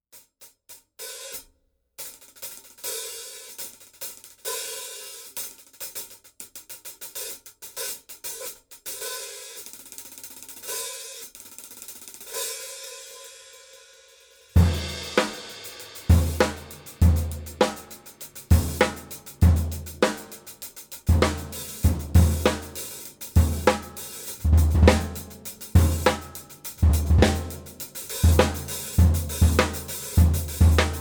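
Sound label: paiste 2002, cymbal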